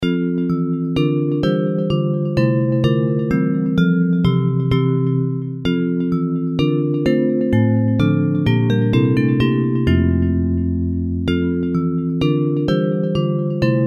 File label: Bells